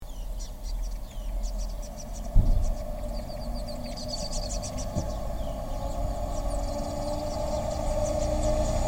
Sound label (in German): Schilfrohrsänger